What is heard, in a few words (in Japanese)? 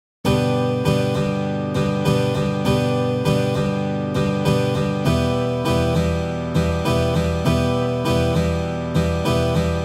8ビート, ギター; 8ビート①; ギター講師が教える1日で弾ける初心者用8ビート